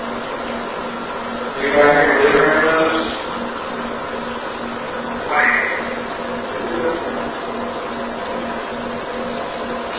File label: Room with Fireplace Unexplained duck sound after team members question; Turner Home; 2nd Floor